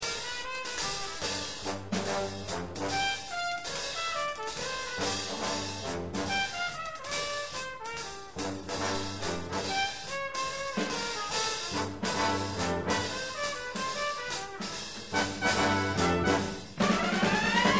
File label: high f sharp